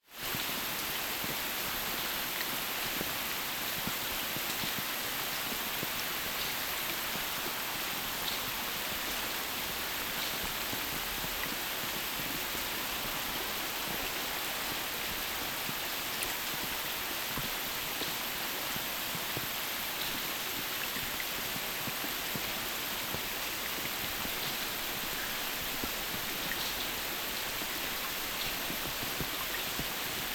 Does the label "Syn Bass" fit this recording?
No